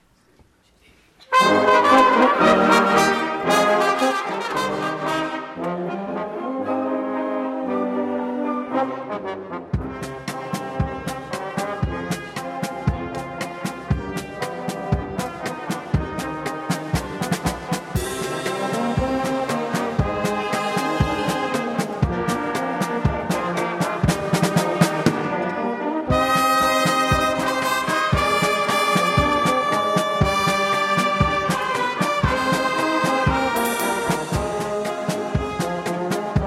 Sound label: band Ukrainian Church; prazdnik trub 2005